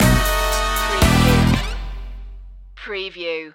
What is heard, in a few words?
the funky one stinger